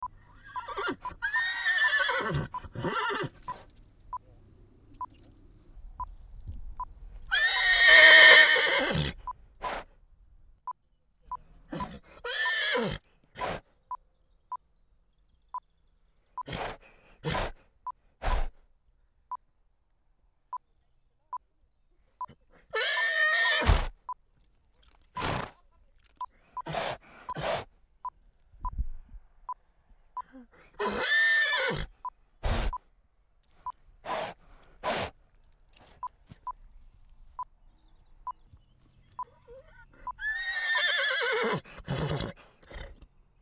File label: Horse Neigh Mono